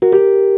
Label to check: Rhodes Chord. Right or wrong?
Right